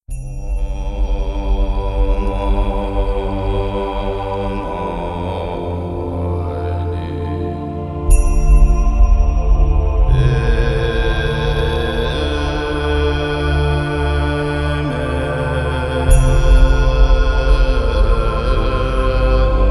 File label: Om mani padme hum